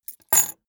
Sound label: key ring small place table hard